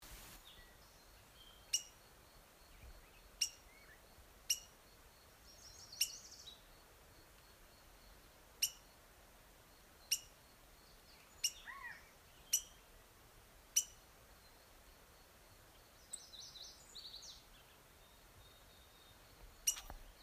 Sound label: Black headed Grosbeak Pheucticus melanocephalus